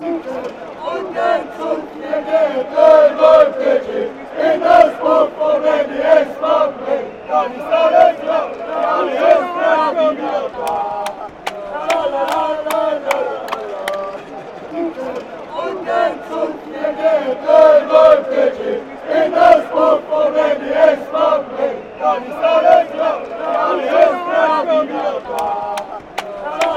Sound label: our hands fanchants